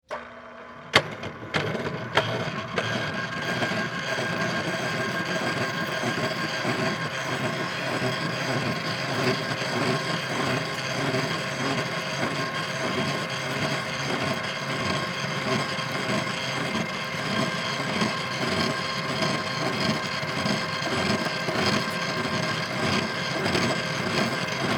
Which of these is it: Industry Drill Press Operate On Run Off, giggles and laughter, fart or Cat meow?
Industry Drill Press Operate On Run Off